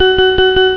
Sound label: extra lives, pacman